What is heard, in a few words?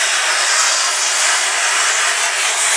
rail fly, weapons